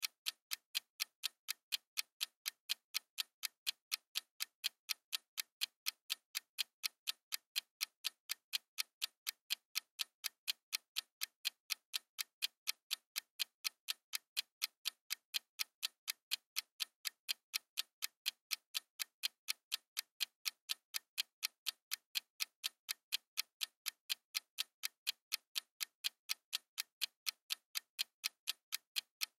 Kitchen Timer, Timer Countdown Clicks Slow Loop; Household; Blastwave

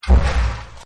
Stomp; Crashes; Objects; Partners In Rhyme

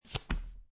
Playing Cards Deal Single Card Turn Or River

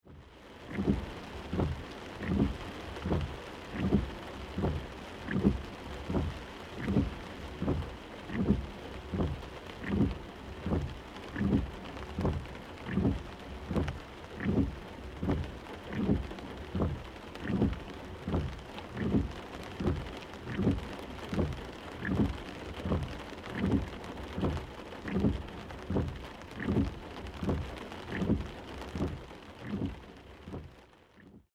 Auto Windshield Wipers Medium Rain Automobile Car; Vehicle; Cars

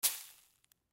Impact Sand Hit Ground; Debris; Materials; Sound Effects:Materials; Blastwave

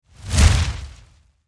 Fire Torch Pass By Large Heavy Crackle Whoosh; Flames

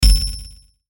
Hit Metallic Tremolo Ring; Production Element Imaging Element Accent Transition; Blastwave

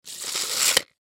Tape Measure Reel Tape In; Hand Tool; Industry; Tools

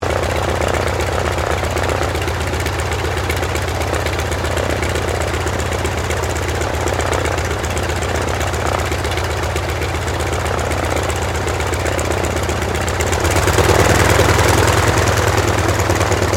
AIRCRAFT CAC WIRRAWAY IDLE TAXI STEREO; CA-16 Wirraway; Airplanes (Sound Effects:Vehicles)

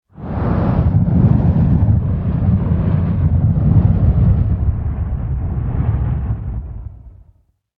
Fire Fireball Wave; Flames; Objects

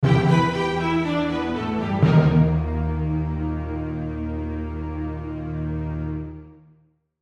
Accent Orchestral, Accent Orchestral Dramatic; Musical; Blastwave

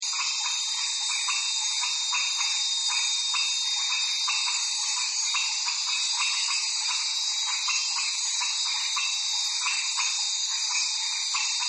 Night Insects

